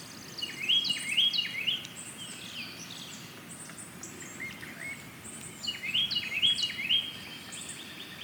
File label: American Goldfinch, Spinus tristis, Aves